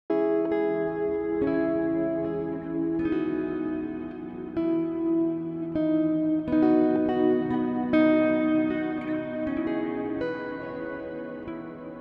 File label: Improvisation